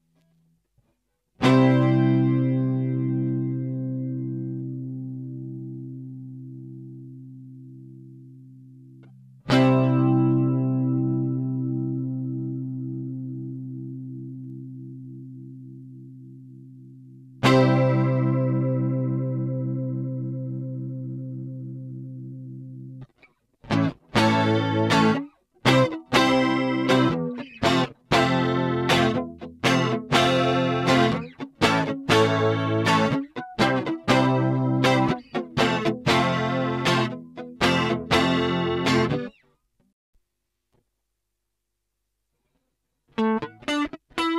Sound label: Danelectro DJ20